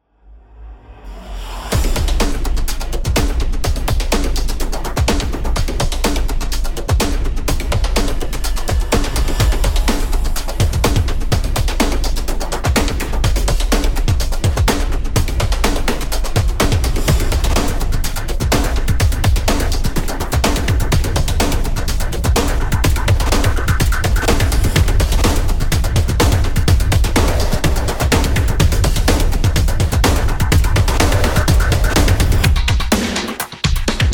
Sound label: Drums